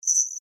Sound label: Insect Voice Chirp, Vocalization, Bug; Animal